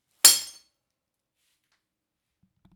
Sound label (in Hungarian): bogre